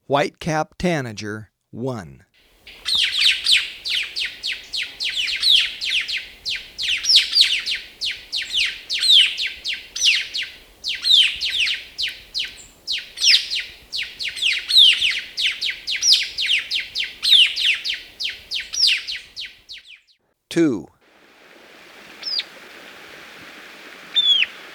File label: White-Capped Tanager, Birds of Ecuador; S. America